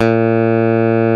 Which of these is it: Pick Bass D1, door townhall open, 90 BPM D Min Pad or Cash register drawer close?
Pick Bass D1